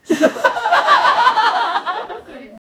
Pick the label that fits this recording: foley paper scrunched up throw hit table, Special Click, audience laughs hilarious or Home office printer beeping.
audience laughs hilarious